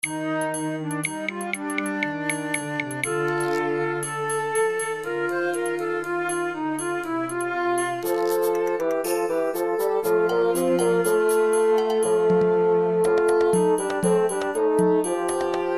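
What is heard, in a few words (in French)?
atmosphere contemporaine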